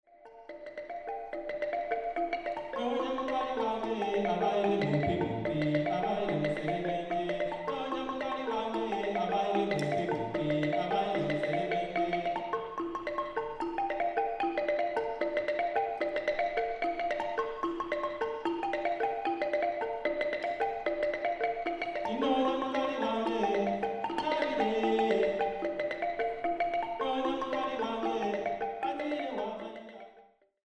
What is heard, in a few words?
african xylophone